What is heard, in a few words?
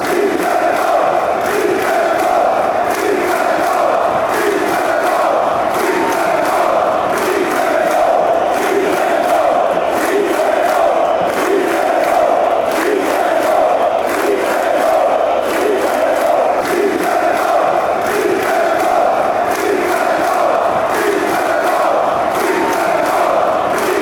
czech republic chant